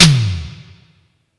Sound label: G Tom Electro, Yamaha PSR E305